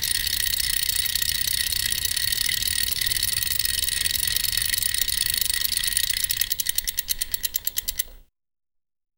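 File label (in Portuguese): engrenagens de relógio som, clockwork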